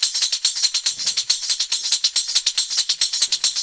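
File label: tambourine loop 140; Best Service Alpha Dance vol.2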